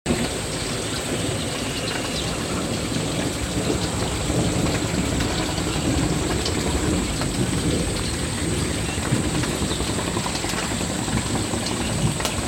rain sounds for sleeping fall asleep with rain at night